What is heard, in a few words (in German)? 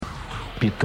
Traktor